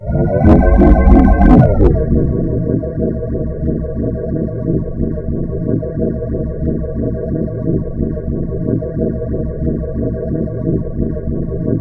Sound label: boss cinematic